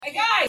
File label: hey guys crow